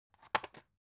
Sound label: drug package drop deep; berufe; arzt